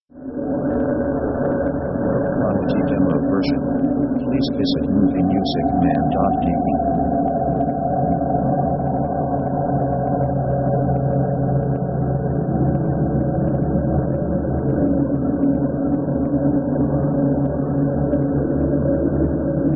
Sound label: awaiting; synth themes